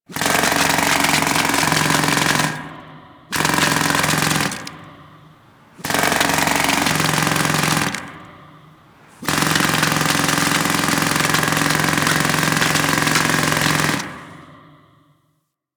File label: Jack Hammer Ice Loop; ambience; props; loops; Warframe; Venus Open World; Venus Solaris United